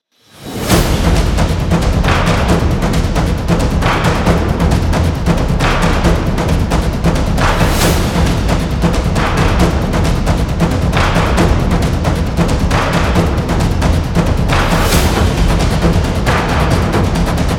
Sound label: Epic Drums, intense drum beats